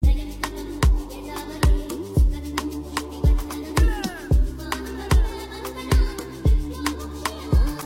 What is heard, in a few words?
ePiano Hindi piano